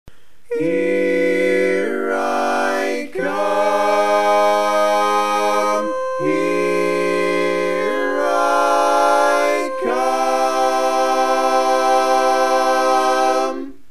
Tenor, barbershop tags

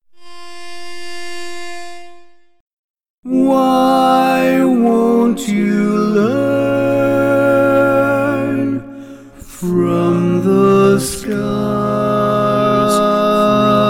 Lead, barbershop tags

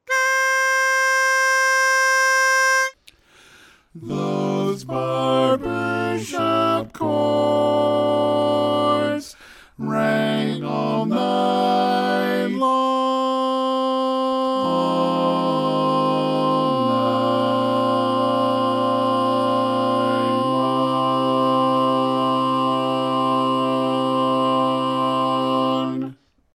Lead; Barbershop Tags

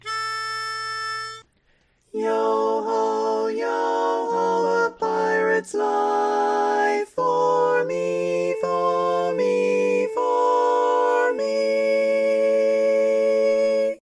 Female, Tenor

